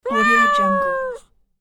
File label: cat meow